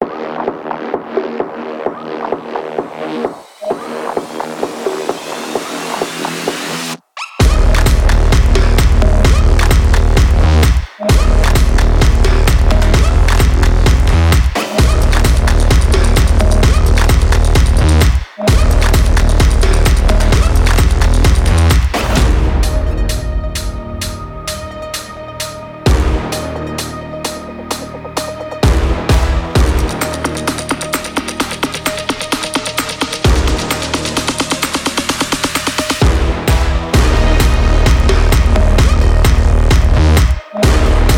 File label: fashion electro sport